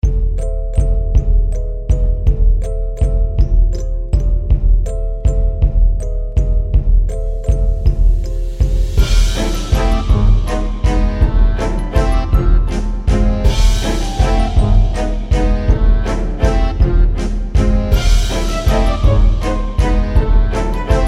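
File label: Halloween music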